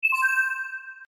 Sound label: notice ringtone